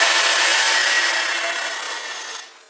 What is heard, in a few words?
FX Loop, Drums & Drones